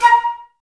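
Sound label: flute, Woodwind; Miroslav Vitous; Woodwind Brass Ensemble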